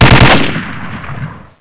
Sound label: ak fire; cscz npc